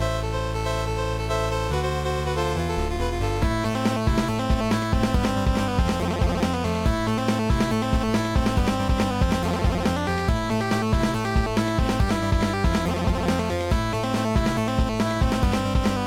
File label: Warning; straimium immortaly